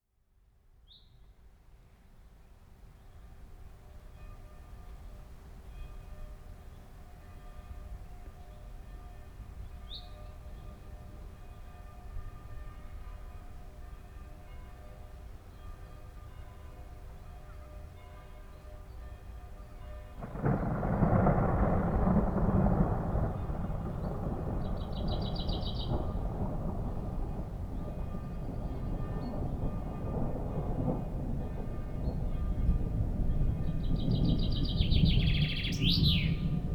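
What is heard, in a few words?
Kunst Akademie Bell Thunder Rain; Munster; field; Kunst Akadmie Bell Thunder Rain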